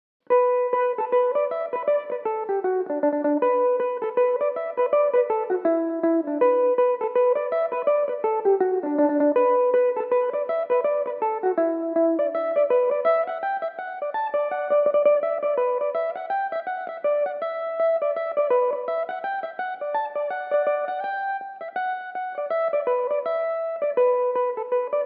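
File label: E dorian, Irish mandolin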